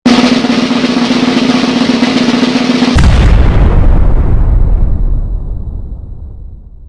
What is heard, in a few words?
Drum Roll Boom